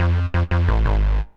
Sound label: 175 D&B Synth Abm; Dance DJ